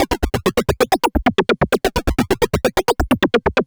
Juno-60 Arp, 130BPM

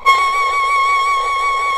ERHU C#5; INIS - Opium

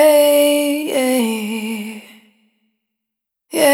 Vapor, Single Voice Melodic Loops

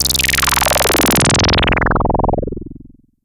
SH-09 LFO Drop

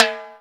TIMBALE; OTHER DRUMS; Rubber Chicken Software Sample Bank; DRUMS + PERC

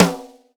sfx perc

